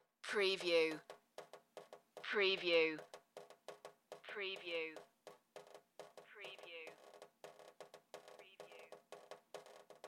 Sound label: Sink Leaky Faucet; Sound Effects Bible